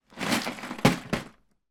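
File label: leisure lego box small movement table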